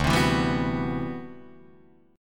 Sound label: D 13th D, down, Guitar, Drop D